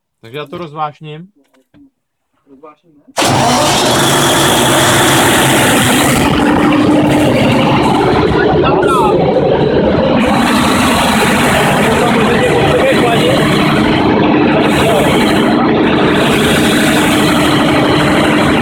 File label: diesel